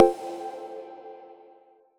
menu edit click; megamix

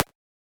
menu click; 『Mikan』

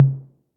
pause continue click; megavaxei

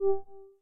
menu multiplayer click; Luxariah Default Minimal

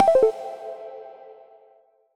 menu exit click; megamix

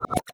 menu back; KW-! If there was an endpoint

